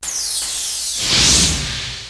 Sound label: Use Unfreeze Heal